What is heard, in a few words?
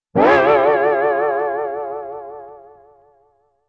hawaiian guitar twang